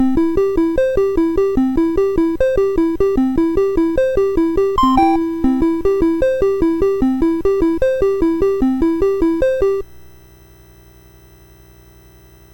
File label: BASIC, MOS6581R2